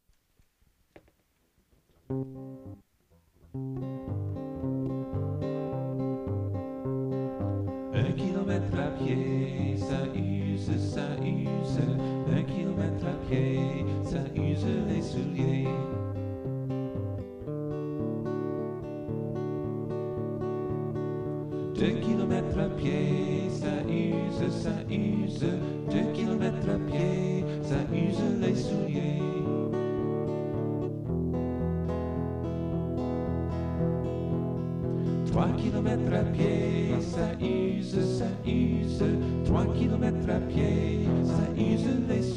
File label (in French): un kilometre à pied song